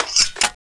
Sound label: reload; zmall